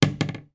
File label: household broom handle lean on internal wall; zapsplat